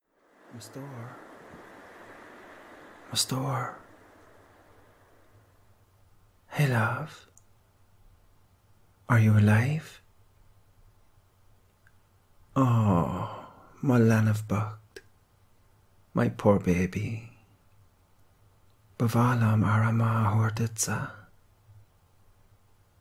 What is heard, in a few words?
heartbeat & rain sounds